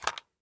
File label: rattle; gmod; arccw uc commons attachments